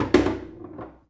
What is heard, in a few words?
Foosball Goal Score